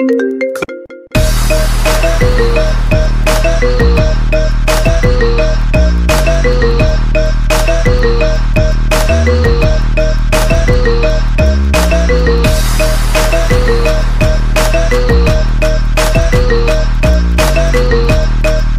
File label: iPhone Marimba Remix Ringtone